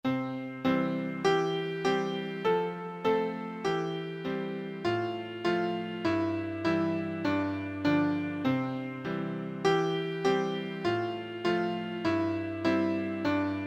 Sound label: Twinkle twinkle little star